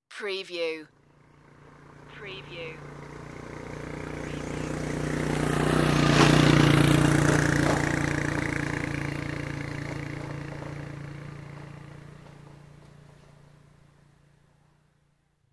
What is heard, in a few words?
motorbike passing